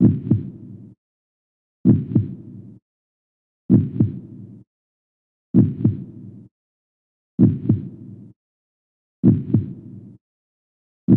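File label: Heartbeat, game music; Fleshchild; windows 2012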